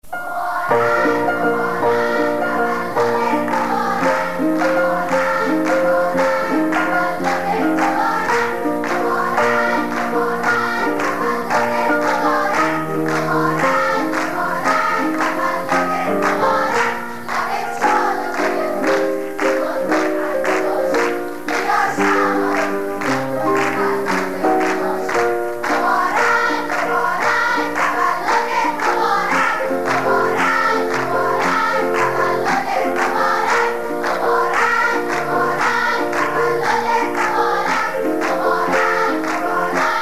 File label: Choir